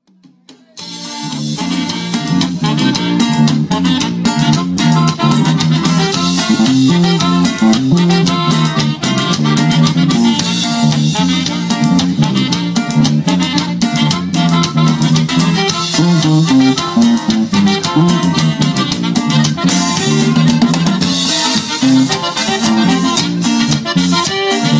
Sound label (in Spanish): conjunto norteña cumbia